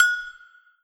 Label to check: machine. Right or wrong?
Wrong